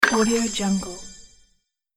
ancient magic water amulet

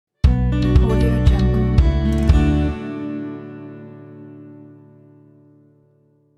acoustic logo

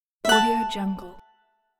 acoustic notification

